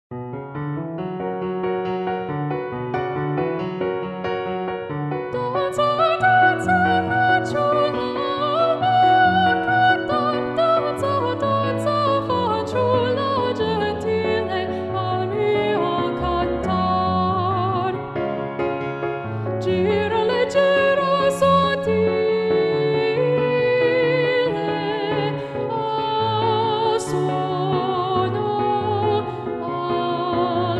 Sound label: danza danza, b min, chorus